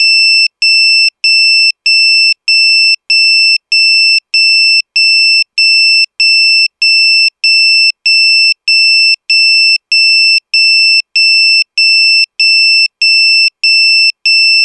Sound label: bell